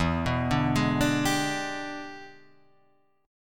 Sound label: E 7th Sharp 9th Guitar Half Step Down, chord